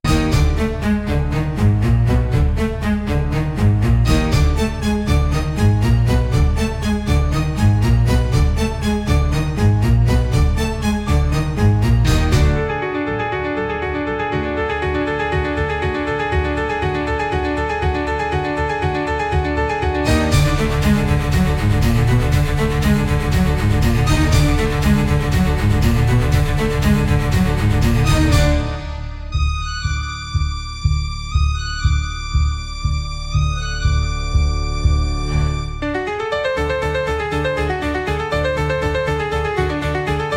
Tumbling Timpani, comical orchestra for slapstick gag scene